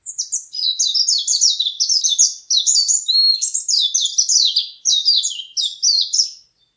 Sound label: Jpn white eye, animals